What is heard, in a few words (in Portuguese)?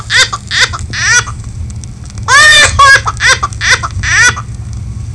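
dor, sons e choros pediátricos; PEDIATRIA UFF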